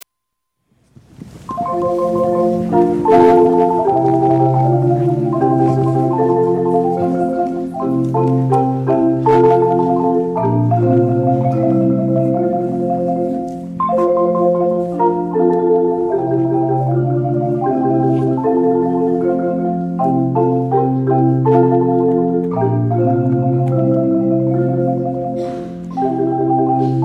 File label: Marimba